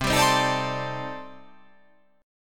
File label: C Minor 6th Add 9th C